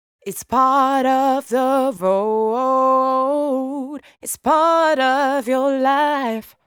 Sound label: 122 Cmaj Female Main Vocals, deep house and soulful vocals; Vital Vocals